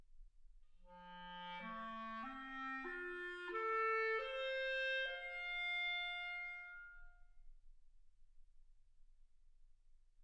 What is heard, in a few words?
clarinet